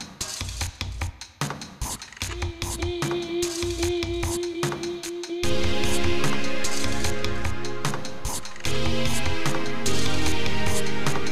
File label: Jingle; Ghost Light